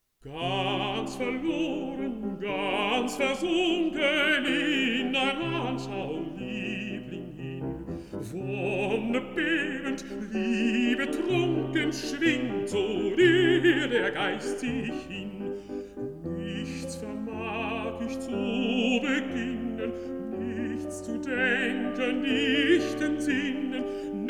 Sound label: song for voice & piano